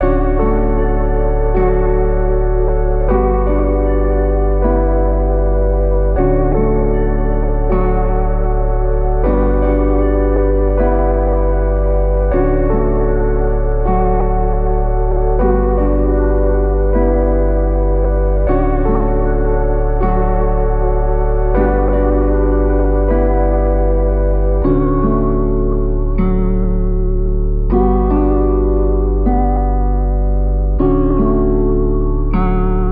78 BPM D Maj, Melody Loops, Lofi; Lofi Collection; Making The Bed

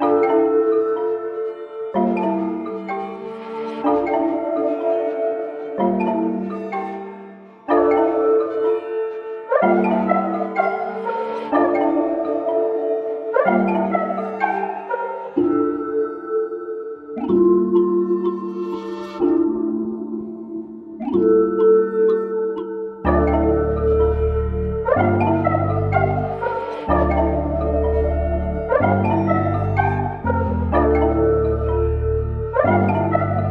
125 BPM D Min, Melody, Trap; Cymatics; 2022 Melody Collection; Trap Pt 1

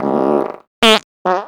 fx fart; Cartoon - film effects

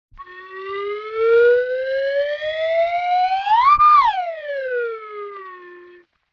Slide Whistle Up Down; Vintage Cartoon; Musical Elements

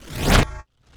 wooshes transform sweetener

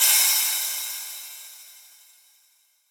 Riddim Crash; Hats & Cymbals

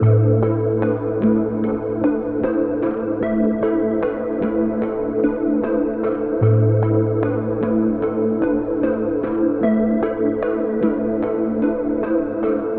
Keys Am 150, Trap; Melody Kits; Loops; Ghosthack; HipHop

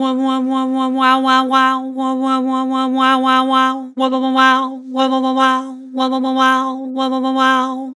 Vibrato "Wow", Vocals; Harmor Vocals

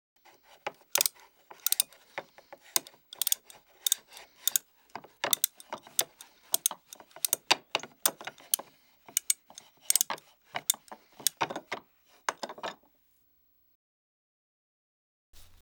Toy Winding Up; Fairlight Sound Lib

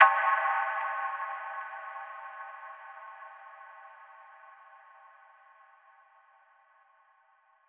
Temple Impact; FX

